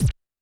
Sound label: percussion, Roland TD-20